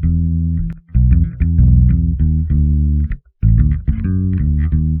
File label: Electric Bass; Basses